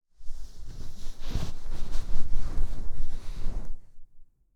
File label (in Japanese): 布団に入る